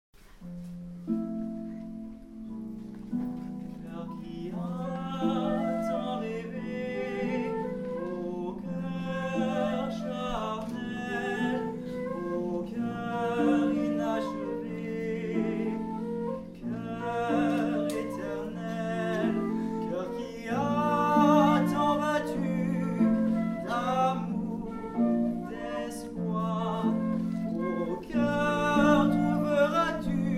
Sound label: ballade; sauter louis; qui tant battu